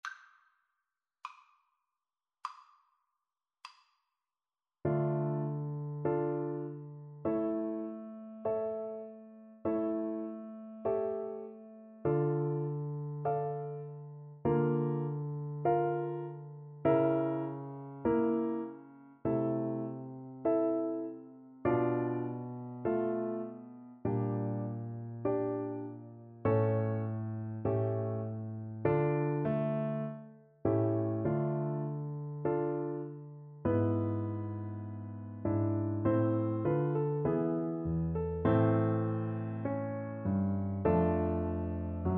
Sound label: concerto am 2nd, Flute; vivaldi rv 356